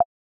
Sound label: Tink; macOS system sounds